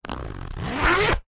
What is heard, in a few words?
plastic document wallet zipper; zapsplat